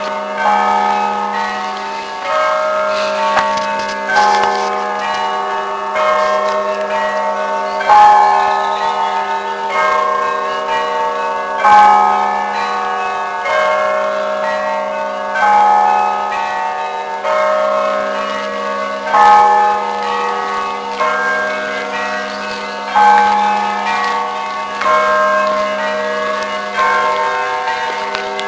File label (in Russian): колокол; ратминские колокола